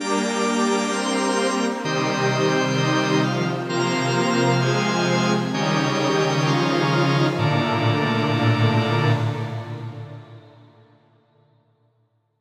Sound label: Game Over; deity quest